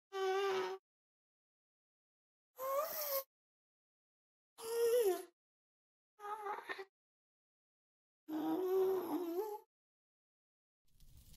Minecraft Ghast; گوست در ماینکرافت

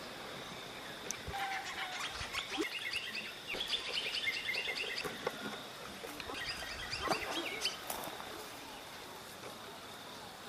Greyish saltator

